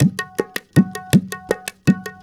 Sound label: INDIA R, Heart of Asia, IND ENS; Spectrasonics - Heart of Asia CD2